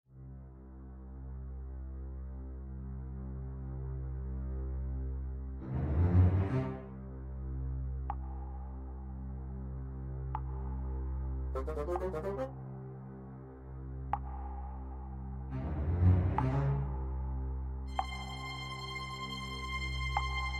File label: shiver